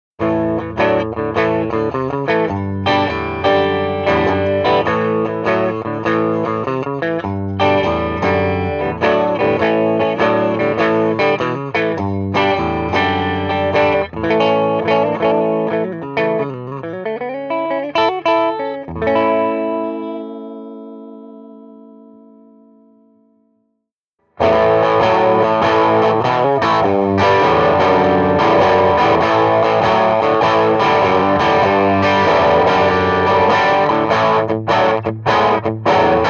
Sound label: Samples Les Paul Clean Crunch Lead; Sir Charles